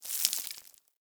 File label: Settlement Sand Fine Debris; Warframe; Grineer Settlement